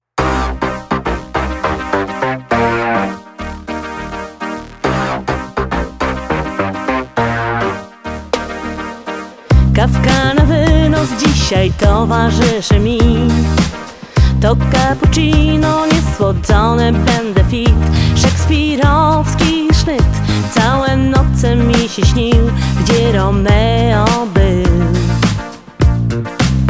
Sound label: jazz